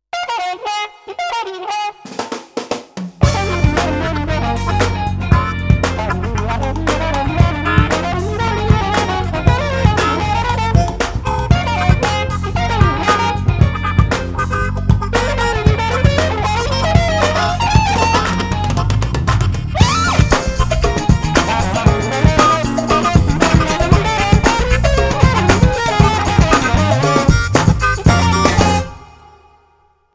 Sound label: Harp funk